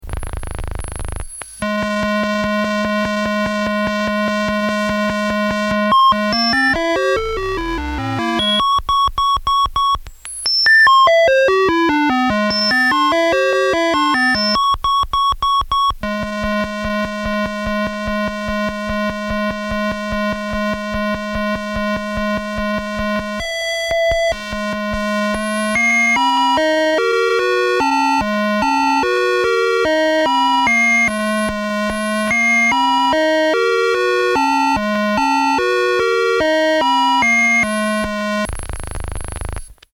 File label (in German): Rechteck Musik Generator